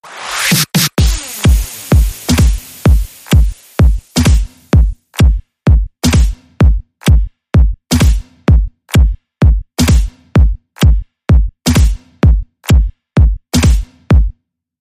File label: Beat